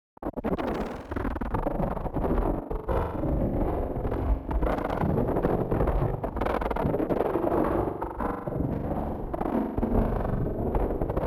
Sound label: Popping, Experimental; SFX; Experimental - Other